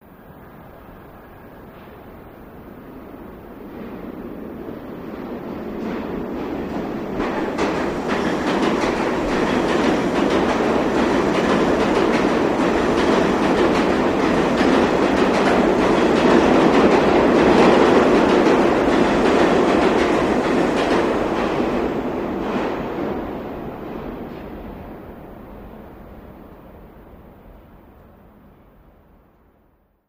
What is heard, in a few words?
Subway Train Pass By